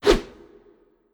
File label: Threat Swoo